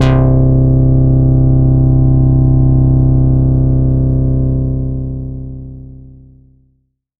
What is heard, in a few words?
pad C2, Moog Minimoog; Pads